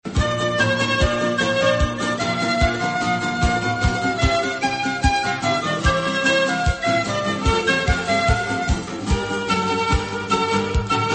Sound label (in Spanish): quena ringtones dulce